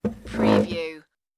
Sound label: move over chair